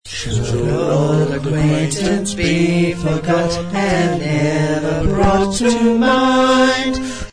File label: auld lang syne